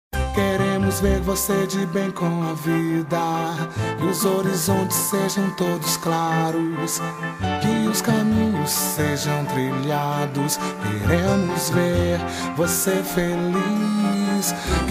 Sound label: Jingle